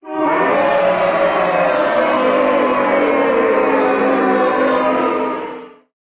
Crowd Boo; Pitch Black Audio Pong

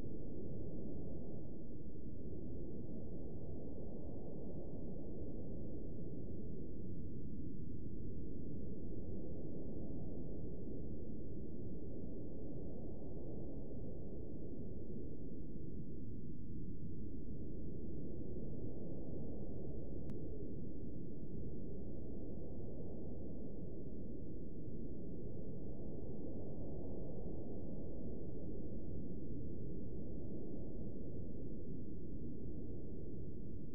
Wind; DeadFright

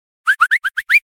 Whistle Comedy Run Start; Hollywood Edge; sound designer's toolkit v2

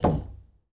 Foosball Goalie Rotate

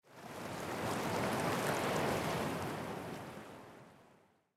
Sea; Blind Quest - The Frost Demon

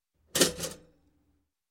Toaster Popping Up; Sound Ideas 2000 Series

